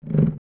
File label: Growl; Moblin; zelda; OOT